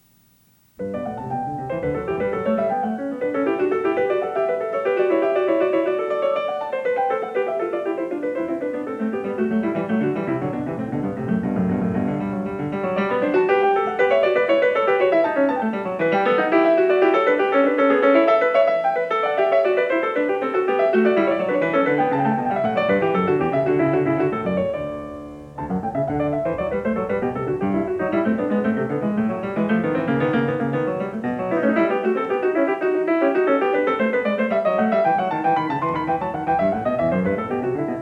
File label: Variation 17 a 2 Clav.; Bach; The Goldberg Variations